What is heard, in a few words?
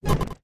Shield Orb Appear